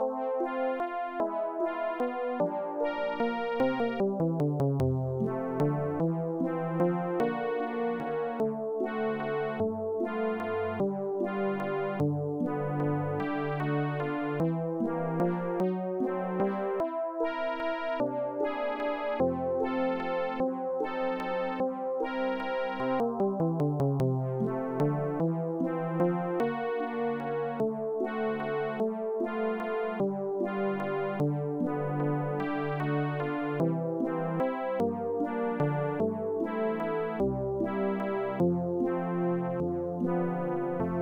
MELODY

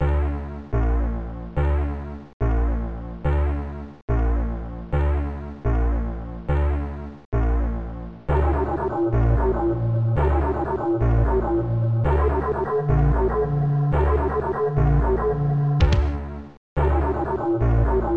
trivia music

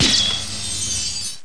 Shield; landslor

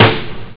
snare; OctaMED SoundStudio V1

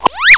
SNAP

